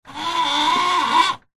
household blind venetian cord pull close up creak

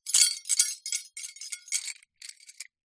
foley medals x6 rattle movement

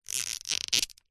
plastic small strap movement squeak creak

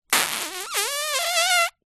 human air suck through lips

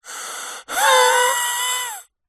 human male breath single struggling wheezy

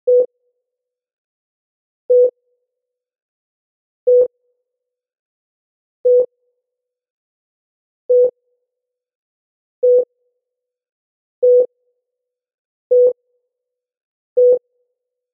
medical heart rate monitor irregular flatline

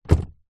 sport bowls lawn ball place into carry case

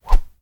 medieval katana sword swing; still north media

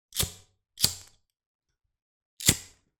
household cigarette lighter flint light 3 times; sound effects one

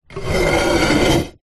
household ceramic fruit bowl movement on table

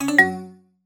app alert tone remove delete

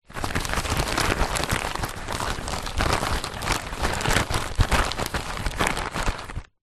paper mail bills pile movement hands

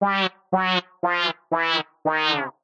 cartoon fail brassy synth wah; zapsplat

